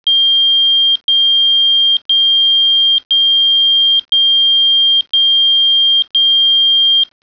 PAGER

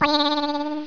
BOING

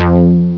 BASS

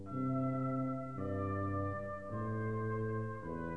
CANON

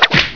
WHIP

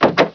switch

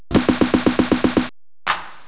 KICK

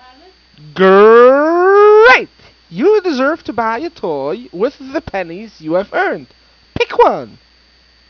WIN

